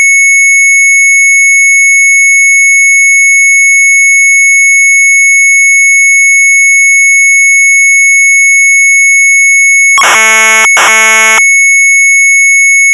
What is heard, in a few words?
Sharp MZ800 Tape